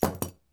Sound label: Small Metal Object Dropped; Fairlight Sound Lib